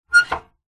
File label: industrial lever pull squeak light